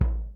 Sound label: kick, Millenium MPS-400